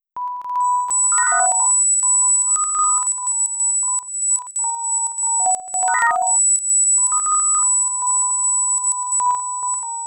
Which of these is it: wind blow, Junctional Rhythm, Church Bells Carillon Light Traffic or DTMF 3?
Junctional Rhythm